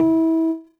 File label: piano e; school; Tony Hawk's Project 8; XBOX; inschool